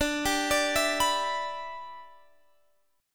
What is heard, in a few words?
E Minor 7th D